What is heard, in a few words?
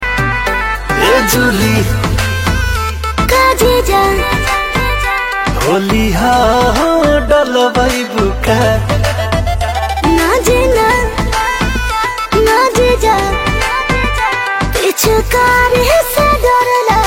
Ringtone